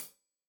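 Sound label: hat closed, hi-hat cymbals stereo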